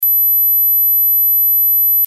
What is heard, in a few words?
tone, mosquito; mosquito gehoor